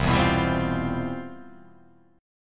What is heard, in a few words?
C Major 11th C down; CM11 strummed; JGuitar